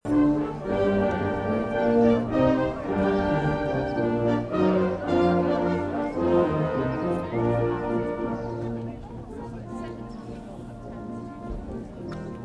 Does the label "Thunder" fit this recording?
No